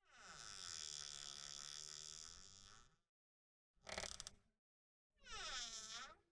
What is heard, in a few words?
Door Creak